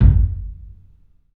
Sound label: KIK, LIVE CLUB KK, Drums; 3D Sound